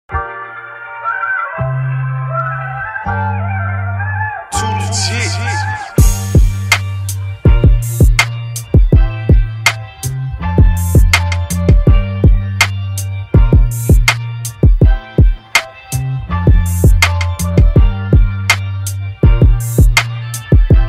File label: Instrumental